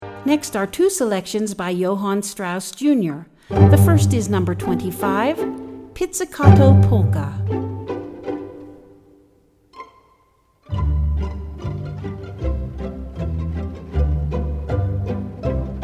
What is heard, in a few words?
Pizzicato Polka